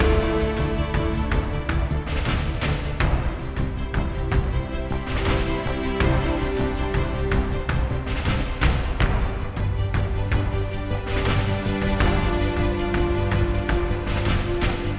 Mood Mid

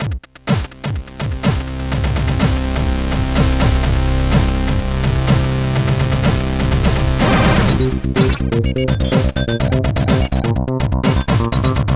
Retro Mini Game; timesplitters 2; Mini Racer

